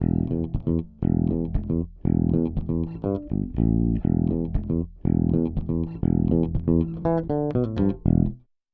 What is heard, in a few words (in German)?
Bass ohne 5254; Rupert Neve Designs